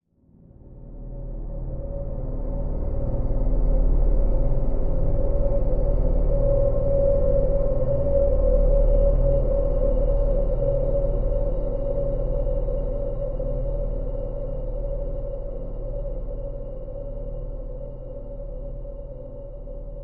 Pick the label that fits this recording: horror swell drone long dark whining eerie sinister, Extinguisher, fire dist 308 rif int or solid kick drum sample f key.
horror swell drone long dark whining eerie sinister